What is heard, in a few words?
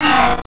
flinch